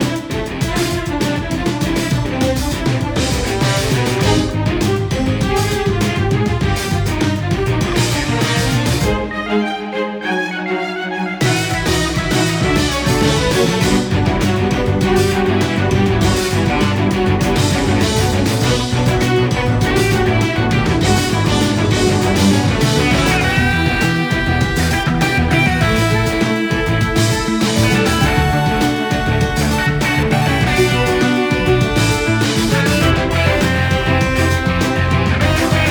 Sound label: loop 200bpm; finalboss phase01; chicory a colorful tale; ps4